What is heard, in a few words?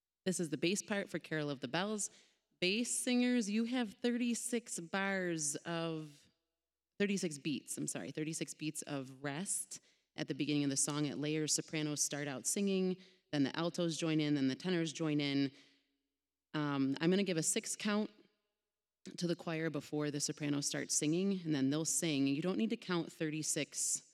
bass; christmas eve